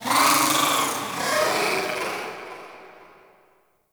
Sentient Lair Exterior Creature

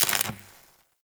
Worker Welder Stop; Warframe; Grineer

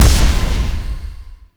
Hand Projectile Die, Weapons; Warframe; Duviri

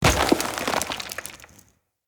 Thorn Bow Stone Arrow Impact Enemy; Weapon Impacts; Envoy Fey Bow

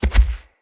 Gallop Default, Animals, Foley, Steps; Bromius

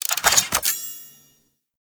Warfan Equip; Warframe; Weapons Melee

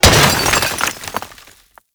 Assassinate Barricade Destruction Small; Warframe; Nineteeen Ninety Nine

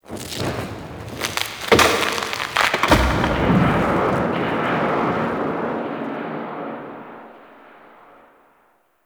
Forest Distant Tree Fall; Warframe; Ambience; Grineer Forest; Titania Quest

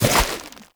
Infested Fishing Spear Charge; Weapons

